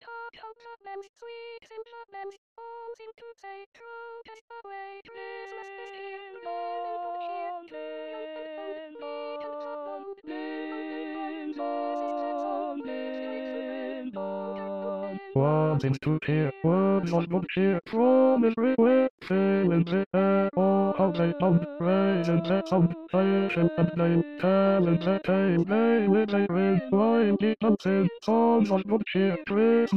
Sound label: Bass; Carol of the Bells; Mykola Leontovych